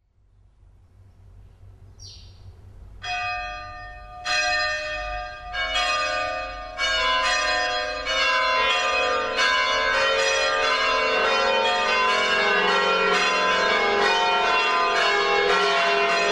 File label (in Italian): campane concerto